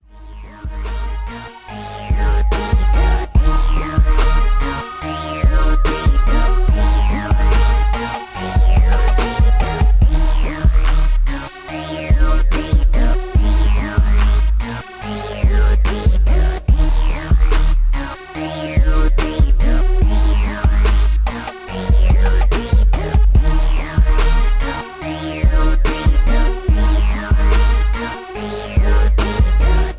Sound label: 72BPM